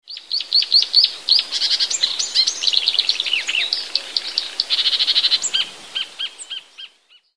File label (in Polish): lozowka, łozówka naśladuje głos niepokoju sikory bogatki; wroblowe; zapozyczenia